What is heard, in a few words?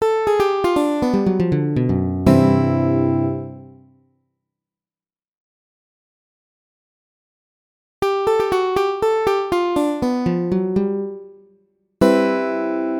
7th lick to maj